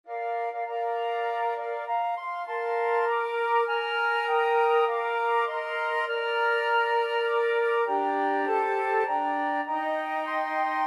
flute choir

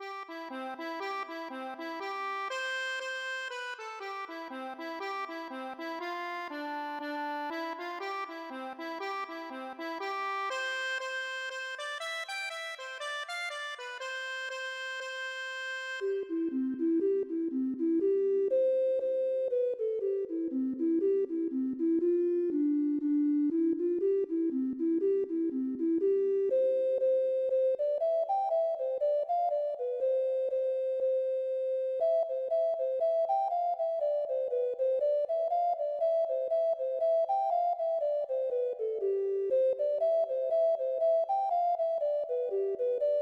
accordion

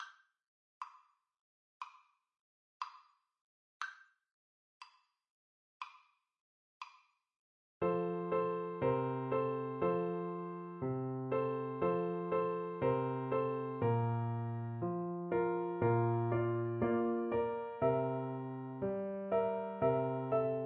jingle bells, Recorder

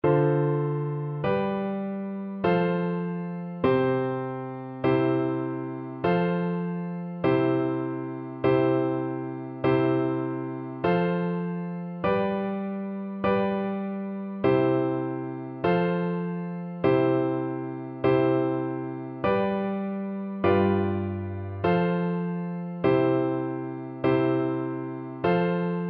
Bassoon

